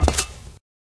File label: black tile, footsteps